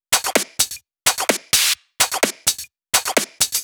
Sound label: Drumloops 128BPM